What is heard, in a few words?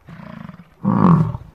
bison; ambient